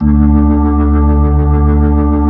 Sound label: B-3